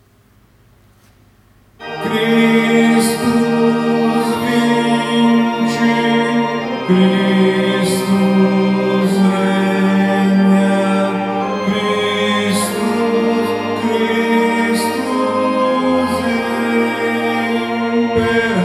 Christus vincit Tenori

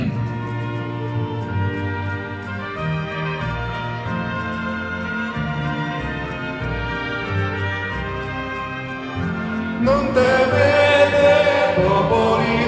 Non temete popoli del mondo Tenori

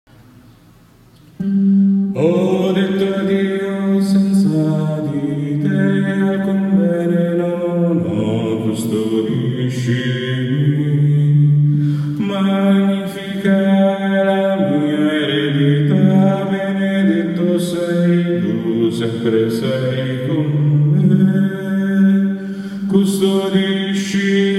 Uomini

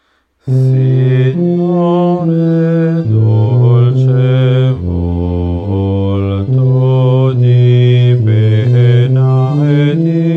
Signore Dolce Volto Bassi

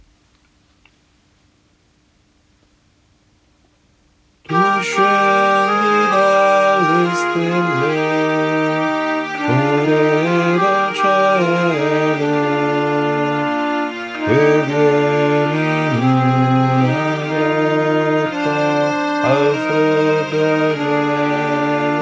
Contralti Bassi; Tu Scendi Dalle Stelle

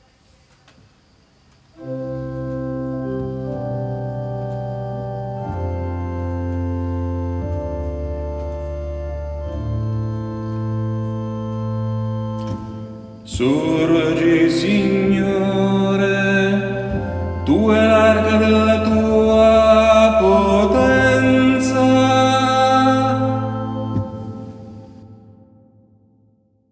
Salmo Alti; assunta

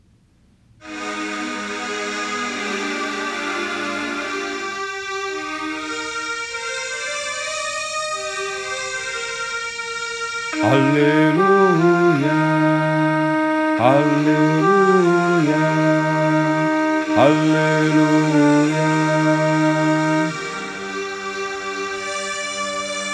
Questo E Il Giorno Contralti

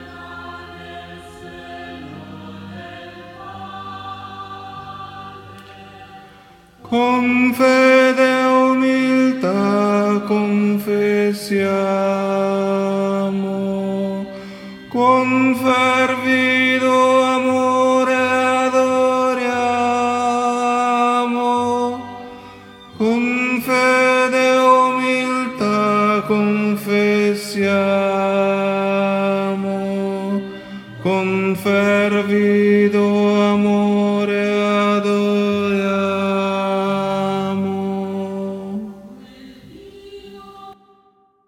tenori; Quel Dio

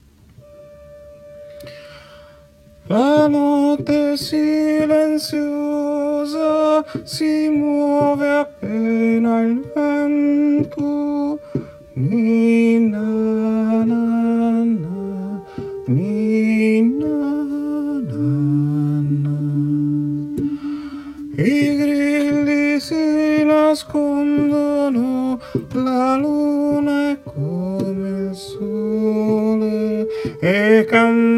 Pastori Soprani

